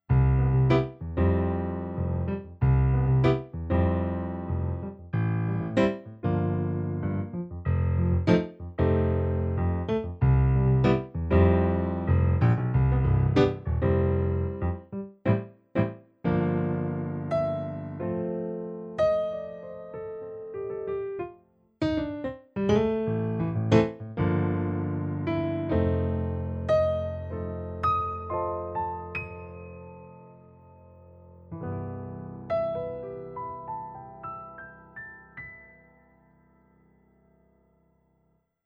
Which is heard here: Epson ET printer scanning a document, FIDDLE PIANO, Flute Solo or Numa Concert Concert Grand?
Numa Concert Concert Grand